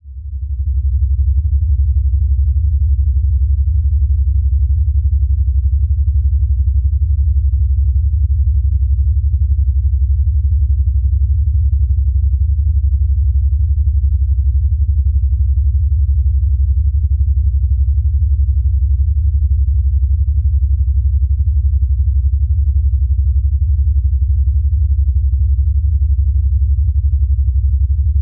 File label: Drone Bed Deep Bass; Production Element Imaging; AdobeCC